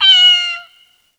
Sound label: Cat Meow